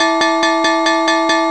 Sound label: Arcade Bells Loop